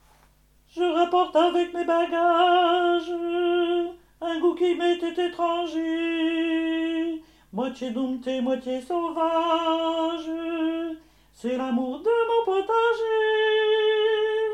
fin basses

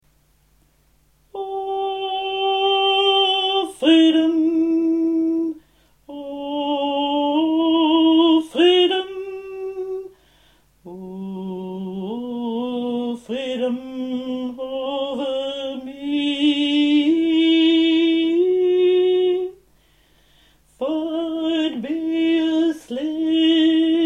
basses

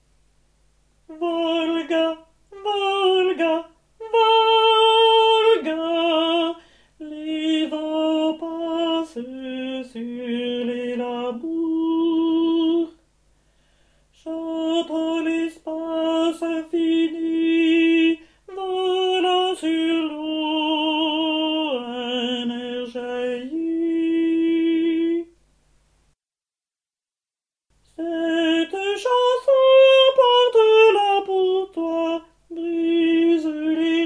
basses

